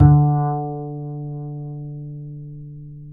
D 4, Bass; Spectrasonics; Bass Legends

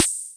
Roland CR-78 Snare Cymbal; classic machines; Reverb Roland CR-78 Sample Pack

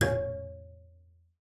bass, C#4; Xsample VOL-5

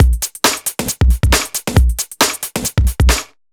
Beat, 136bpm, uk garage

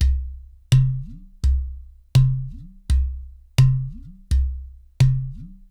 LOOPS 84, Percussionworks; Sampleheads; NYC Percussionworks; 90 s Sample CDs

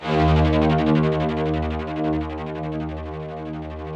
ROUGH STRNGS, Yamaha VP-1; Music Store

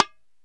BONGO HI SLAP; Akai MPC3000

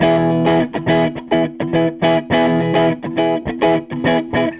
Gretsch Guitar Loop

